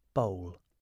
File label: bowl